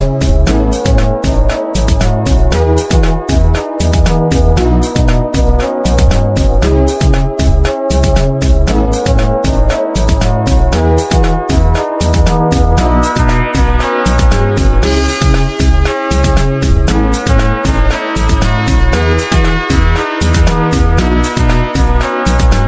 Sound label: cute moroccan ringtone